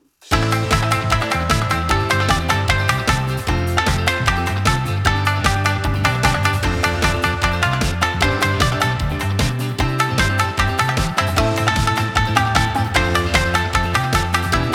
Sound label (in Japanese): ノリのいい三味線BGM; 風神; 和風ロック 風神